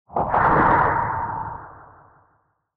Retro Anime Robot Low

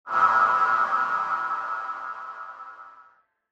Horror Accent High

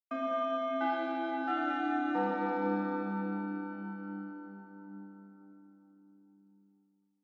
Japanese School Bell Far Fast Short

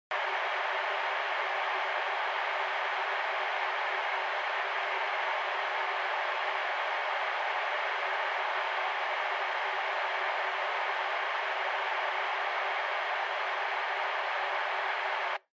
Megaphone Noise Dry Static

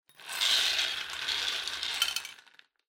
Drawing Machine Garapon Spin Drop

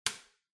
Light Switch Rocker Hall Mid

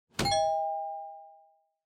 Quiz Button Fast

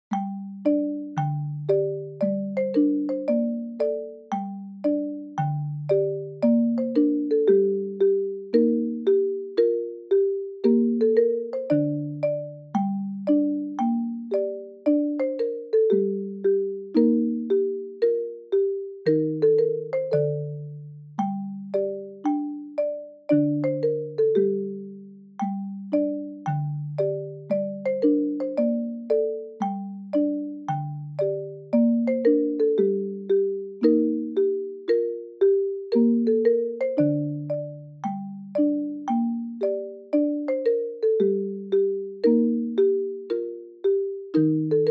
Shuffle; Yuki No Odori

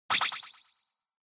Flash High Short; Onoma; オノマトペ 光る; 高 短

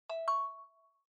Smartphone UI Sound Speaker

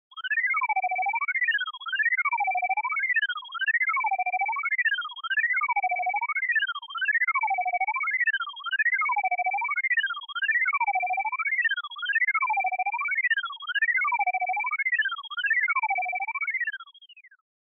Retro Anime System High

